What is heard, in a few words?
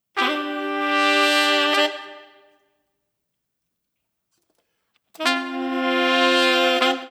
NuJazz Horns C; Digidesign; Pro Tools Essential Demos; Essential Loop Demos; Demo Session - Jazz